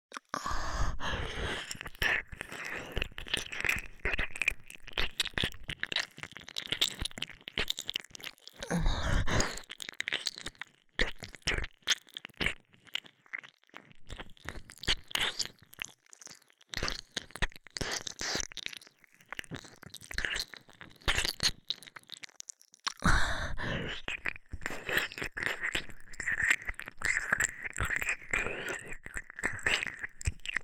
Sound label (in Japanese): 左耳舐め, ASMR